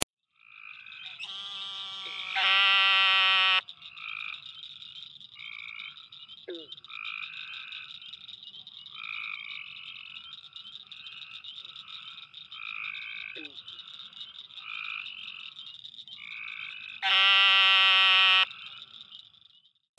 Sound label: narrow mouth toad